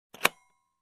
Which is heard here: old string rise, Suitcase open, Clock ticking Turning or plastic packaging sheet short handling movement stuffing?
Suitcase open